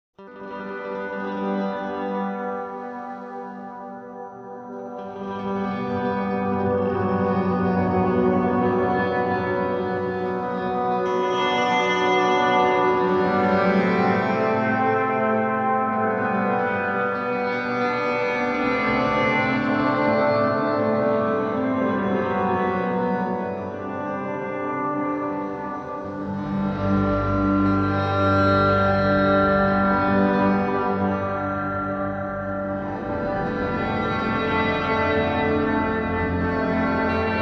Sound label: ambient dancefloor pad; remix this!; weeklybeats-2014